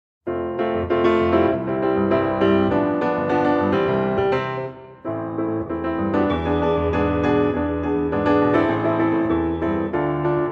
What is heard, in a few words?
Backing Track